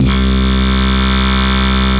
Synthesizer Middle C Notes, Lead

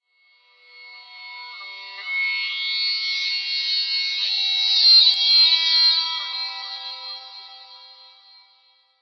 guitar flower pads

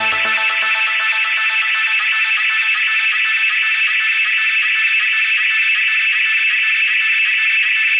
dreamy very bright synth

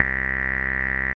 filtery synth bass; SYNTH KEYS ETCETERA

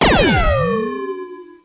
ringmod down; Weird and Interesting Hits

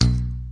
Electro FX; Ueb HE Electro FX

